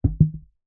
household waste paper basket plastic empty knock over